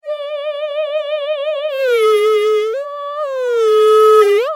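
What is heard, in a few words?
shakuhachi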